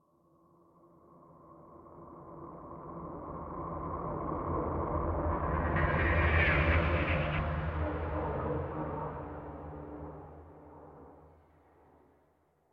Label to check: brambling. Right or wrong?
Wrong